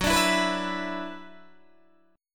F sharp 7th Suspended 4th Sharp 5th, down, Guitar, Open D